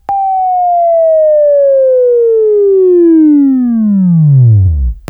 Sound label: WAV Left, Sweeping